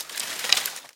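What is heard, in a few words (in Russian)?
звук шуршания фольгированной бумаги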